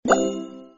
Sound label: Upgrade FX